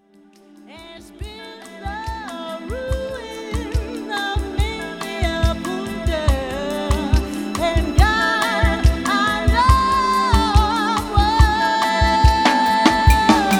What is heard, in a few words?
Acid Jazz